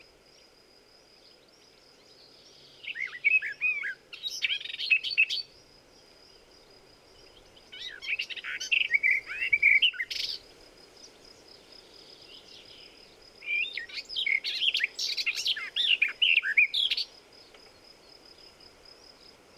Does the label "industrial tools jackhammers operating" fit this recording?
No